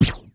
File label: Shell; Guns; MicromanÃa 35